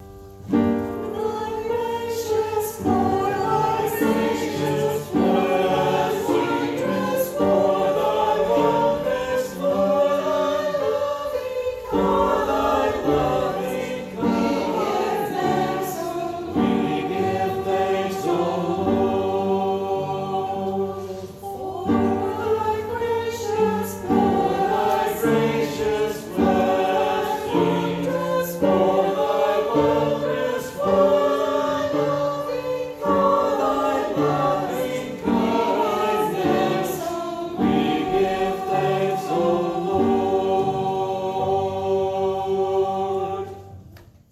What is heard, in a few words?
Parish Choir; Special Music; Holy Hilarity Sunday April 16 at Mt. Zion